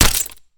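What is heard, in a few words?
dart pistol, attack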